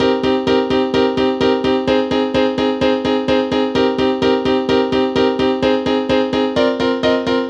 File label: piano; hackathonSounds